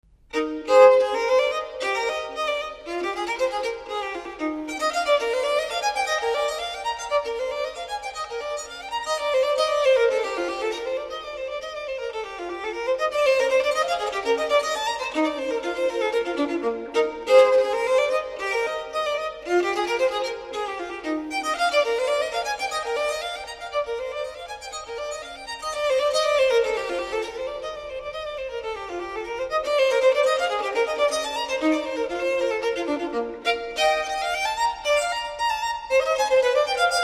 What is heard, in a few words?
Sonatas and Partitas for Violin Solo, Partita III E-dur, Bouree; Bach, J.S.